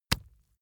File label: leather impact mud hard